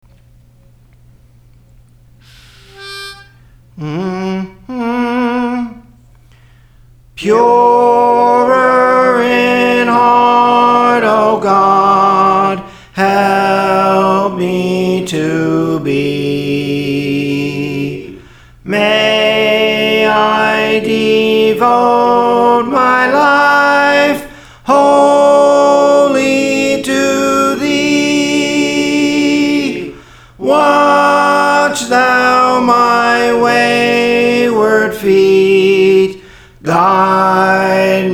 Soprano, Praise For The Lord Training

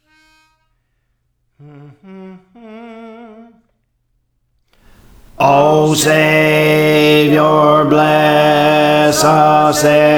Bass, Songs; O Savior Bless Us Ere We Go; Praise For The Lord Training

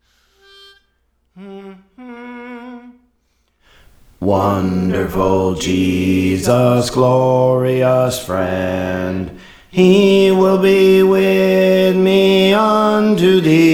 Bass; Praise For The Lord Training

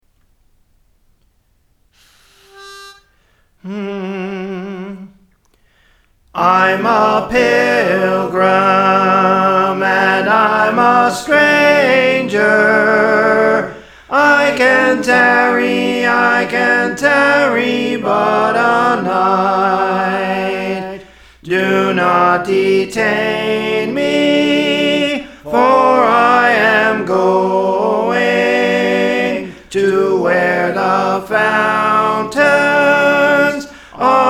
Soprano; Praise For The Lord Training

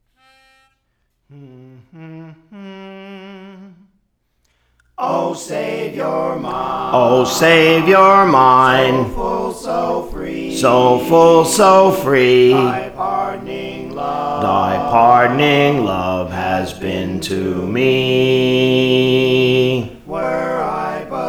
Bass, Songs; O Savior Mine; Praise For The Lord Training

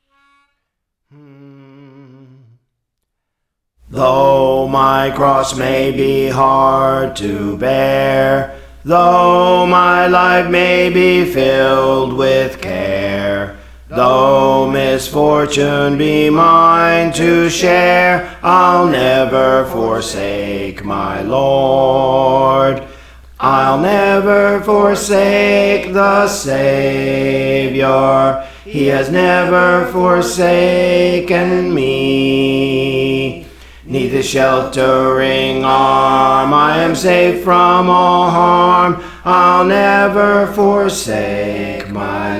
Bass; Praise For The Lord Training

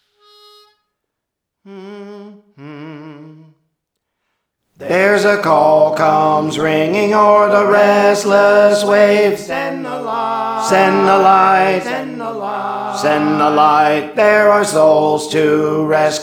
Tenor, Praise For The Lord Training

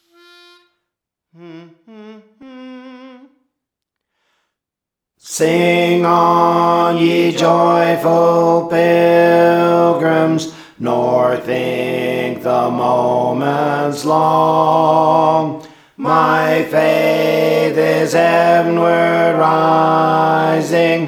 Alto, Praise For The Lord Training

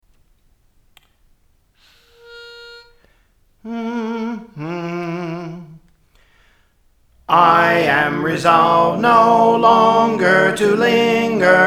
Soprano; Praise For The Lord Training

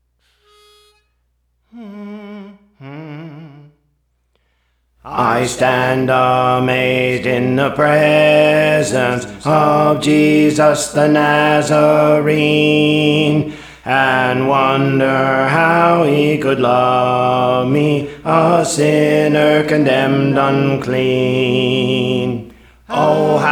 Alto; Praise For The Lord Training

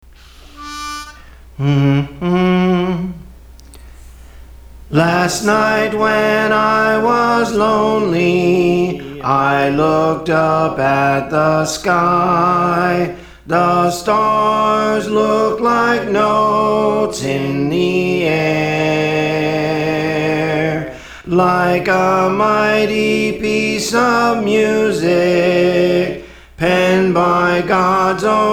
Soprano; Praise For The Lord Training

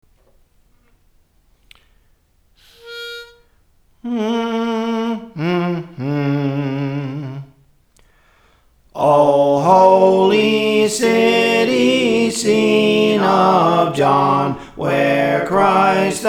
Soprano, Songs; Praise For The Lord Training

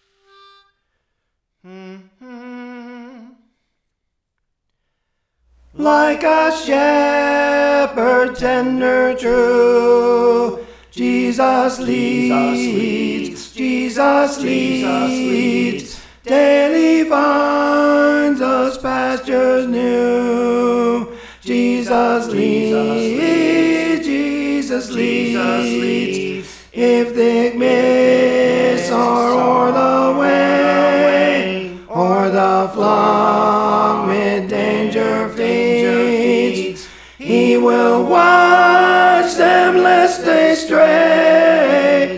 Tenor; Praise For The Lord Training; Jesus Leads

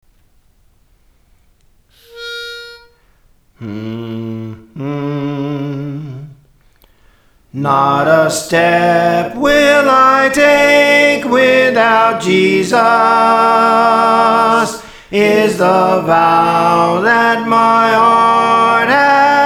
Soprano, Songs; Praise For The Lord Training; Not a Step without Jesus

